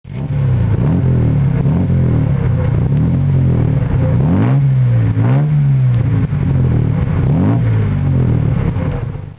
lancia aurelia b20, Lancia Aurelia B20 GT series 5; 1956